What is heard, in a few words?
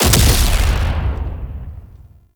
Turret Projectile Explosion; Warframe; Duviri; Turrets